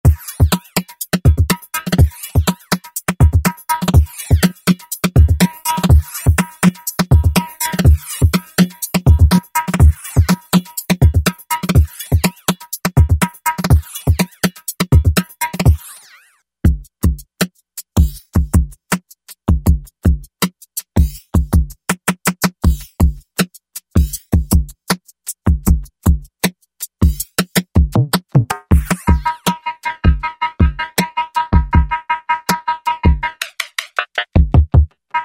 Hybrid KIT, Roland V-Synth; Factory Patches

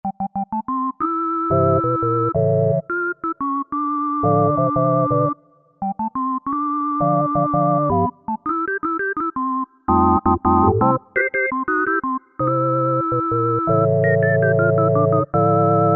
JP-8 Organ, Roland V-Synth; Factory Patches

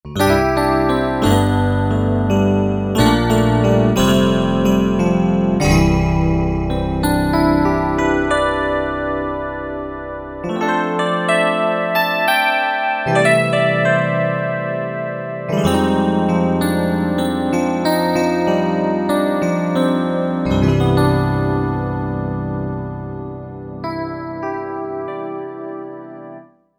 Crystal EP, synth

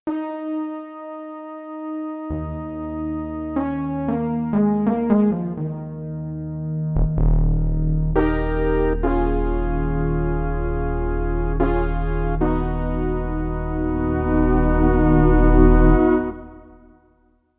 Analog Horn, Roland JV-880; Factory Patches

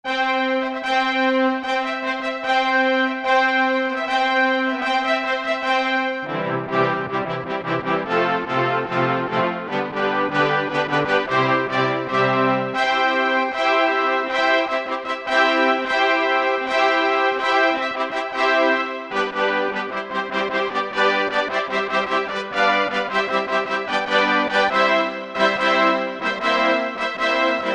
Fanfare, Korg MSC-4S Orchestra; Korg MSC-4S Orchestra 1

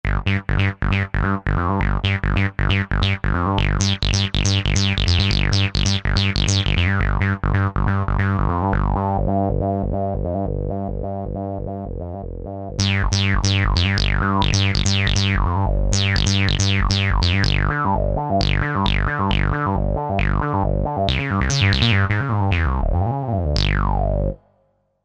Mega Bass; Korg TR-Rack

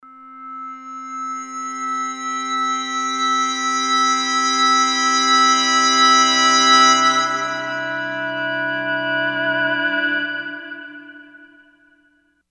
Glass Harp

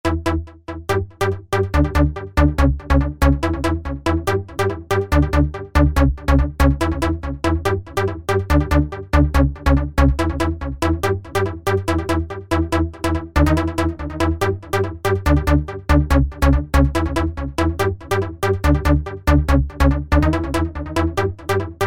SYNTH TEXTURES - Riffer; Rawaii Riffer; Apple GarageBand 2

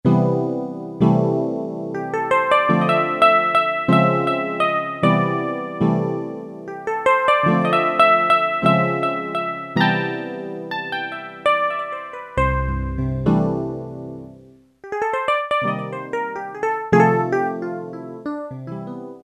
ELECTRIC PIANO, Yamaha PSR-300; Voices

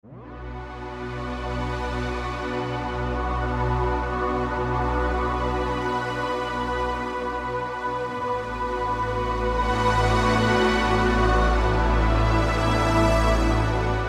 Vintage Pad

